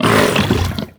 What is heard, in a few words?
pull grunt, barnacle creatures; Half Life Alyx